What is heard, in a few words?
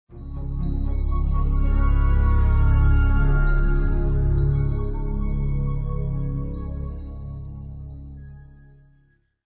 Building Generic; Civilization Revolution; Xbox